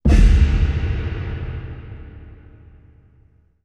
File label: Slow Hit; Title Sequence Effects; Sony Pictures Sound Effects Series